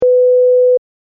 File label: Censor Beep Short; Beep Sound Effects; electronic sound effect